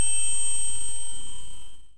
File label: tinkle